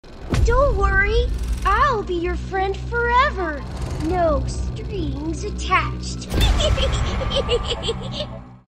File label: strings, reverse sound